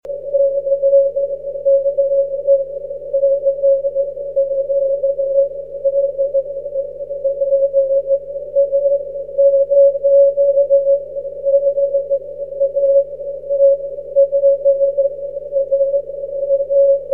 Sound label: cw